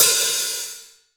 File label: hot open hat one shot c sharp key